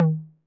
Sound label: Tom Mid 808 Sat; Saturated